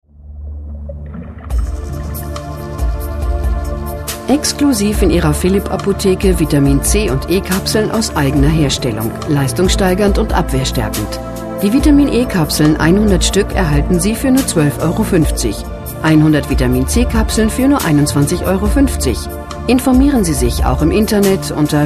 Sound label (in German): warteschleife; philipp apotheke